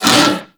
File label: Kubrow Armored Vocal Pain; Warframe